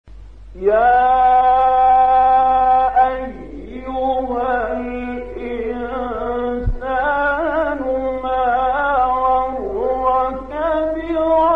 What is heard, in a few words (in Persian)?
تلاوت آیه سوره; انفطار; استاد خلیل الحصری